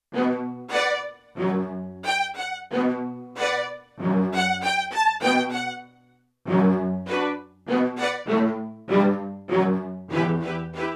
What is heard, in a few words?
Stereo Bows, Violin Orchestra; Yamaha TX16W